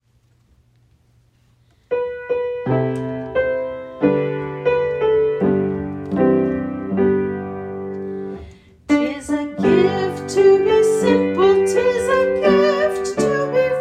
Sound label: Simple Gifts vocal